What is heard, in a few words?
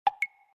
Woodblocks, notifications; ACE Clever-1